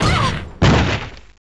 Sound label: superyeet; mai natsume